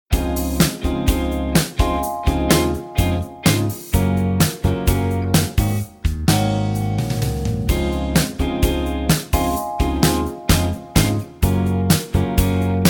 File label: Db No Backing Vocals